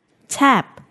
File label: tap